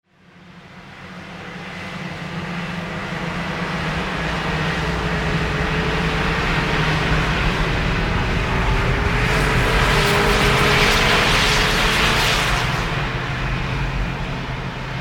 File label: Snowmobiles Yellowstone